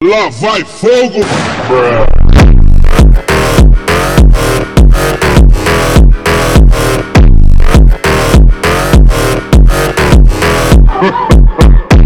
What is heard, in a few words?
phonk, FUNK Slowed